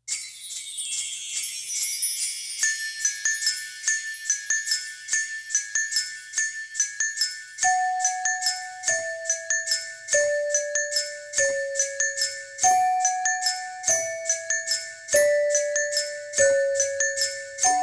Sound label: Holiday Bells